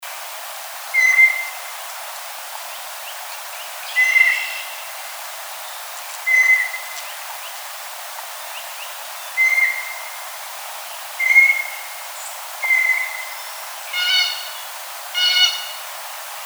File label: Blue Jay